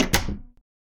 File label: door close